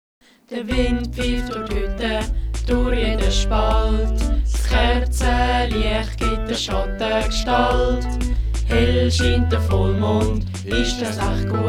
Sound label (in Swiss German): De Stier isch los vocal